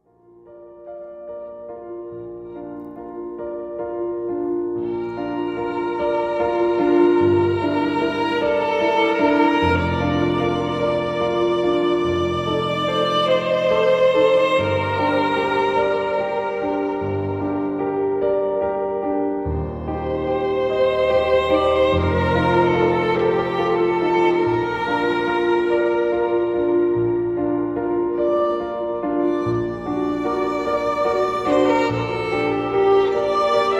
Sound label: Pop violin